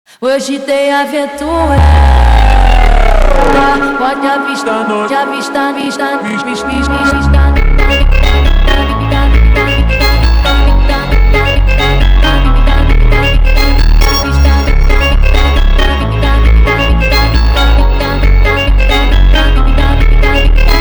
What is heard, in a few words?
super slowed